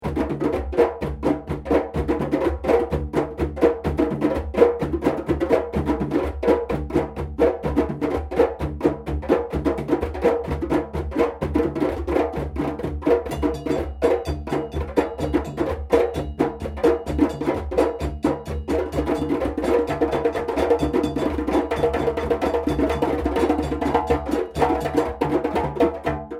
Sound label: makuru, drums